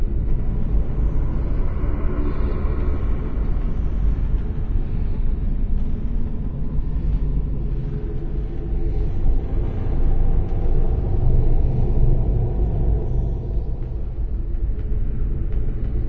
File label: Maze Ambience Creak; Doom 3; 2004 pc gamerip